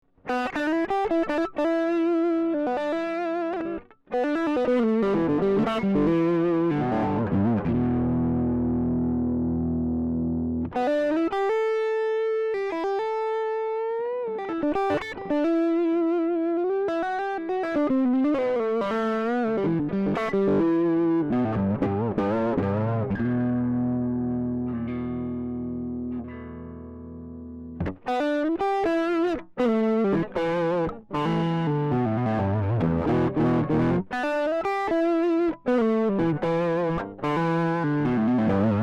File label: Boogie, Gibson Firebird V Reissue electric guitar; The distortion is from a Doc Scary overdrive unit. No Reverb, EQ, or other effects.